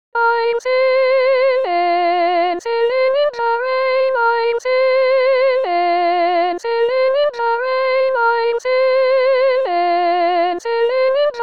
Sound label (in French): singing s chante